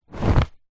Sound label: foley shorts combat drop on floor; zapsplat